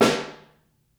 Room, Snare; Ableton Foundation FreePacks; Snare Designer

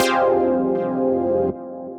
Chord, Synth Lead; Metro Chord; Ableton; Factory Packs; Punch and Tilt

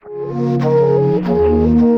Happy Flute; Inspired by Nature by Dillon Bastan; Vector Grain Presets

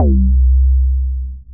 Submerged Bass, Synth Bass; Ableton; Factory Packs; Beat Tools

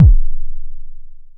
analog deep, Kickbank

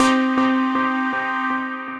Cosmic Chords, Synth Keys; Ableton; Glitch and Wash

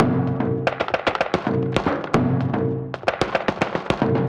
Kit 112 bpm, Drums; MIDI Clips; Gravity Werks; Ableton; Mood Reel

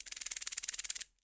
Cabasa FX; Latin Percussion; Drums; Drum Hits; Shaker; Ableton; Factory Packs

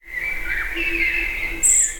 Field Recording Selector, dark textures

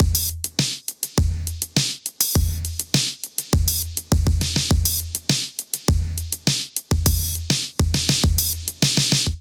Kit 102 bpm, Drums; MIDI Clips; Sage Reef Kit; Drive and Glow; Ableton; Factory Packs

